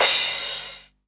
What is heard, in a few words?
CRASH, Dance Mega Drums; Best Service; CRASHES